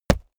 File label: step walk stone; schritte